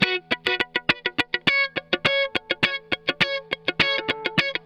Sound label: 103 FUNK GTR; 103 BPM FUNK B; Drumdrops; Compact Disco